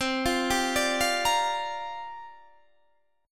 C 7th Suspended 2nd Suspended 4th C down Guitar Standard; C7sus2sus4 arpeggiated